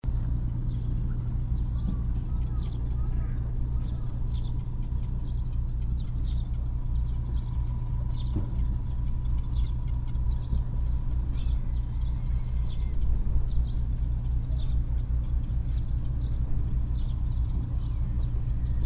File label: fishing port; Nokoita